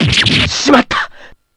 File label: stab; ky kiske